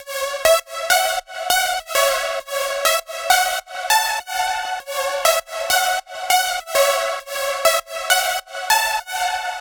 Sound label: synthwave arp lead 100bpm Gbm; synthwave anthems collection